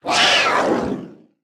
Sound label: Springpaw Attack